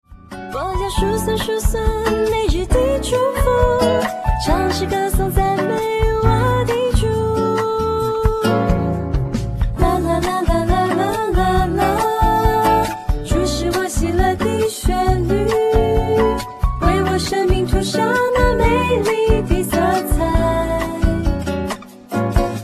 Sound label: Joyful Melody